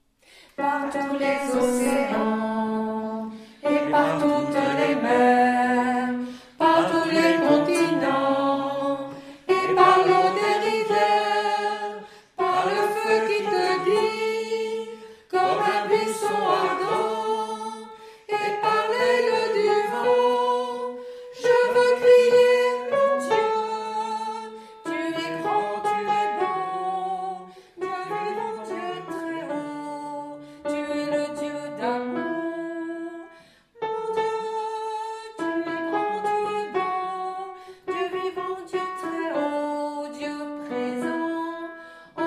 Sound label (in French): Hommes; Tenor; Psaume de la création